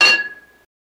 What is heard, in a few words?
Glass Clink Almost Me; glass clinks